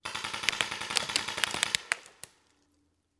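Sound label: Paintball Gun Impact; Sound Ideas; Guns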